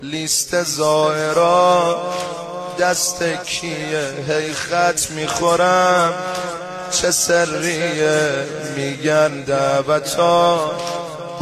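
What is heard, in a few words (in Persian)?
مداحی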